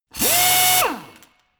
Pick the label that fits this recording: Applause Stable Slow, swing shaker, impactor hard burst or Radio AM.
impactor hard burst